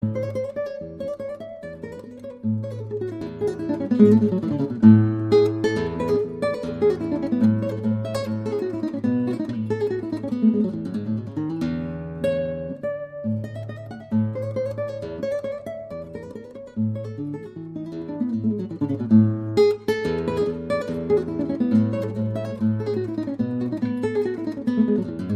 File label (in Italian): Andantino Varitato